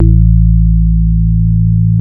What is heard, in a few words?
Organ Bass; AMG - Prototype Drum & Bass